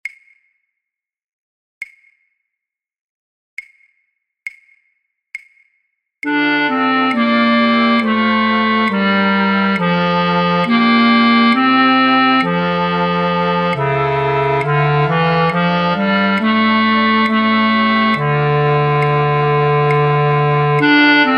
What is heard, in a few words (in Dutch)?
fluitorkest, Basfluit 2A;Basfluit 2; voor oefentracks; Matthaus Passion